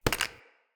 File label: Acorn Pickup